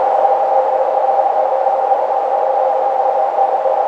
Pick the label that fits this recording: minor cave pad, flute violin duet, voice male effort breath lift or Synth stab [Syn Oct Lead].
minor cave pad